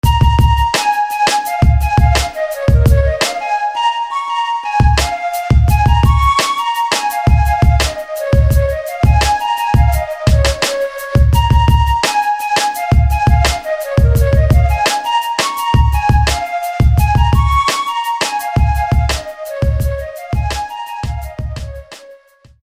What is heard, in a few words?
Flute; Asia Sun; SFB FX